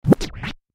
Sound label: Record Dj Scratch Spin; Record Dj Scratch Spin Short; Dj Sound Effects